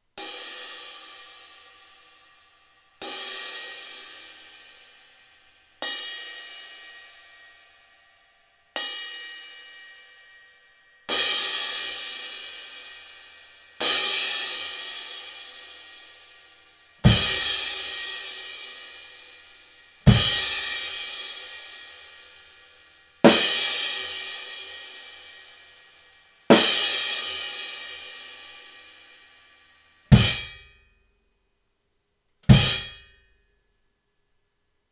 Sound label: Crash Paper Thin 16; crash bekken; Amedia; Classic